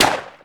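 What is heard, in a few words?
smith wesson exterior shot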